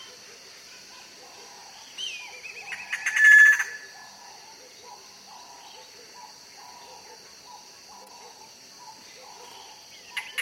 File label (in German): Sumpfwürger Laniarius bicolor